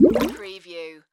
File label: Tank Bubble Large